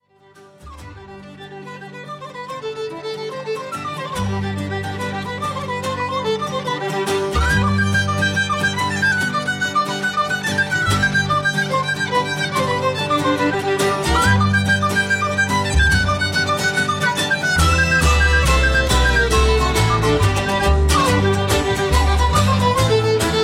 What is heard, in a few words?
Fiddle Folk - Irish washerwoman; Fiddle Folk Family